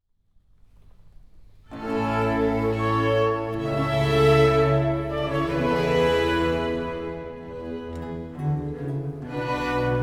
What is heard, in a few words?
Preludio, Ensemble Baroque; Sebastien de Brossard; In convertendo Dominus; Ensemble Baroque de Limoges